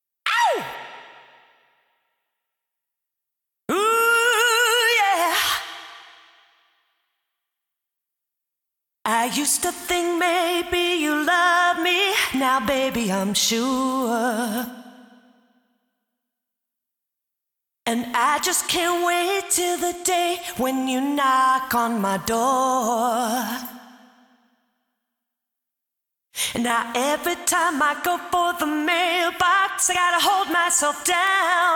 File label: vocals